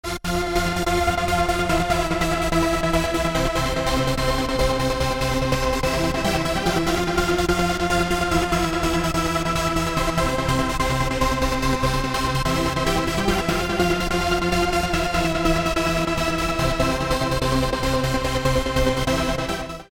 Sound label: midibox sid